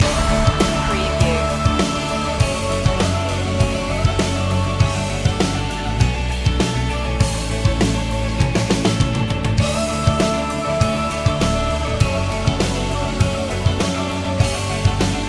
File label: live loop